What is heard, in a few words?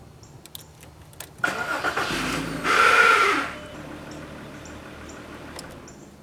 starter noise; root cause starter grind identified; 1st generation gd 01-08